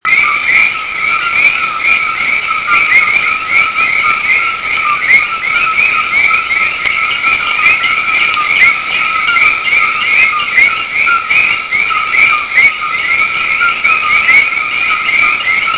anuran chorus el yunque national forest puerto rico; summertime / nocturnal / chorus; of 13 species of rainfrogs in the El Yunque / National Forest, Puerto Rico, together with that of insects, can / be almost deafening; The amphibian chorus in the rain forest on El Yunque; westindian